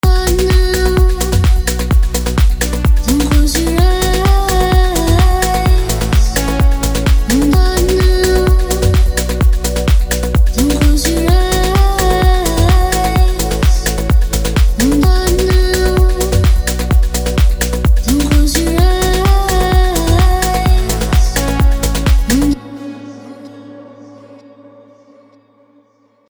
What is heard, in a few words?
Melodic Tech Voices; Vengeance Sound Avenger 2; SQ Close Your Eyes